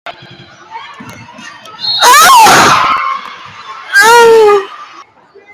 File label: samsung notification meow